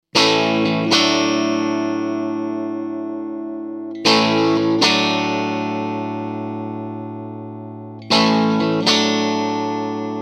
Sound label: Fender Crun Rev